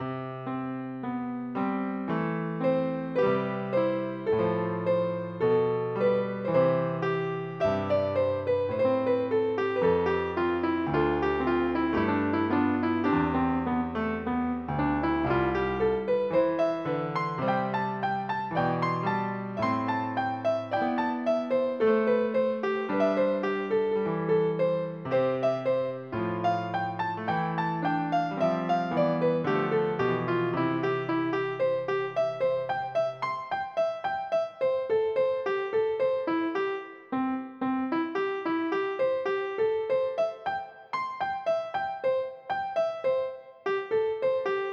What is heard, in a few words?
CHORALE